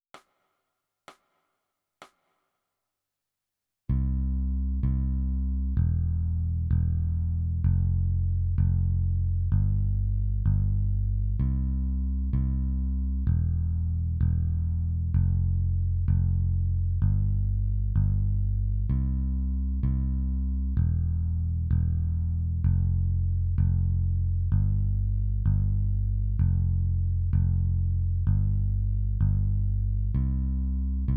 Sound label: Bass